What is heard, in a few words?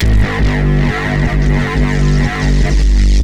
Fizzy 808, Basses; AMG - Prototype Drum & Bass